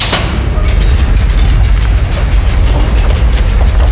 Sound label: ir strt, doors; quakemapping; mod quoth